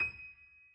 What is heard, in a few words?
key